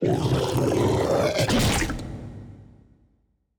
engulf; weapons; cutholoids; Stellaris; grand archive

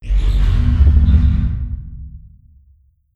distance tachyon lance windup; weapons; Stellaris

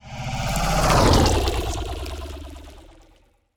ant ship weaver move; biogenesis

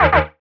alert; Stellaris

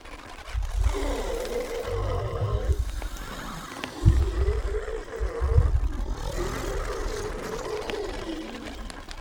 dimensional horror idle; Stellaris; guardians

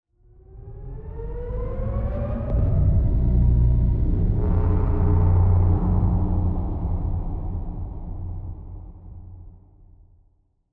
psi ship colossus move; ships; Stellaris; shroud

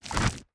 swarm station explosion small; weapons; Stellaris

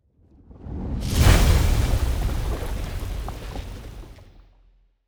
aquatics dragon attack mouth deluge

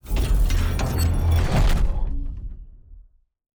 action activation refresh triumph; astral planes

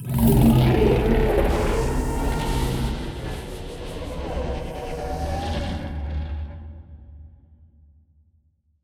void worms troika death; Stellaris; grand archive; ships

